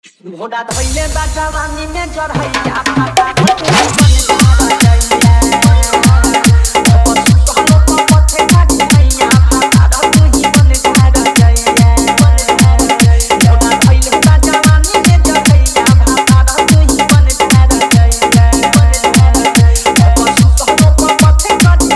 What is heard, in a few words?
Bhojpuri